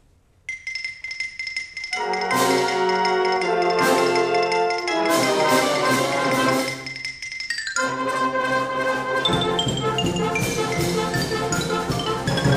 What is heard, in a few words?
Gallop, Concert Band; FCC